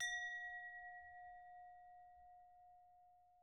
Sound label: bell glass; angband